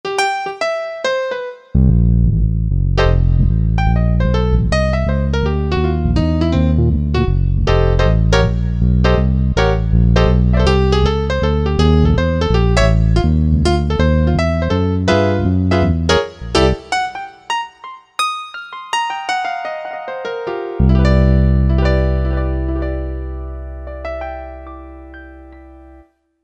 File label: Bass + Piano, Korg MSC-9S Organ